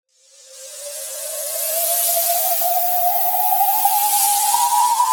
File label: Murkiness Riser FX, Dubstep; Ghosthack - AC2024 - Day 13 - Dubstep Sounds 2025; Effects